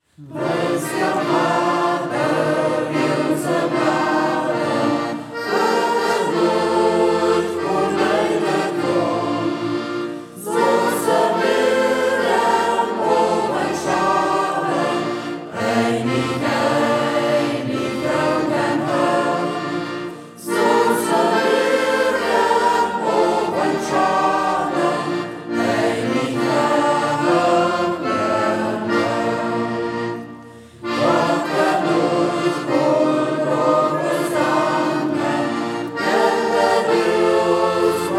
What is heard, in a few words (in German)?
Singkreis; Singkreis Kampestweinkel; Ortsmundart: Braller; Wießt tea, wä de Riuse blähden; hegt wird gesangen